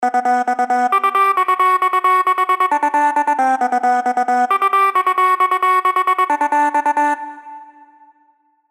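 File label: Lead Vocal; SERUM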